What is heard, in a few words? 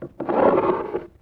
Glass Slide Only; Vintage Cartoon; Foley